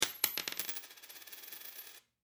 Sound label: Foley Coin Dime Drop On Counter Long; Money; Objects